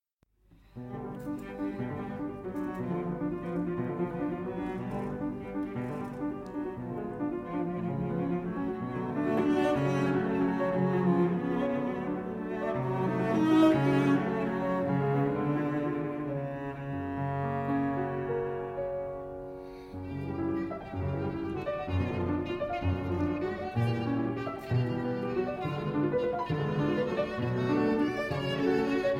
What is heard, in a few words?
Piano Trio in B Major, Finale. Allegro, Live; No. 1; Op. 8; 1889 Version